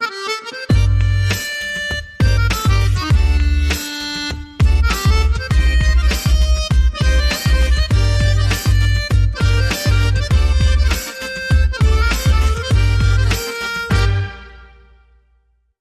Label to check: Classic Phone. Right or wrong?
Wrong